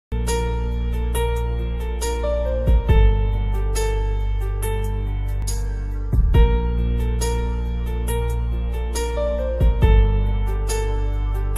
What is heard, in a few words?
music romance ringtone, title song instrumental ringtone